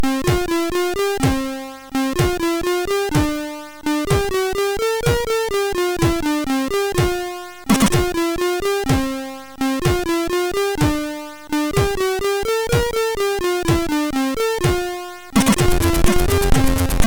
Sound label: Melody, sid MOS6581R3; HVSC; Fuckin Melody